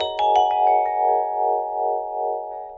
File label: vb g#, vibes; house; PS-28 Vocal House Samples